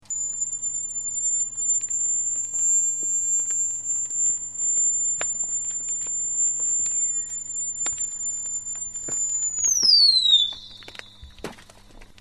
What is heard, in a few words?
call